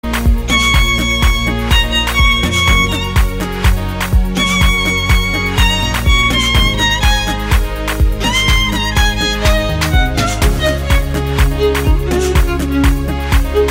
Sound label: violin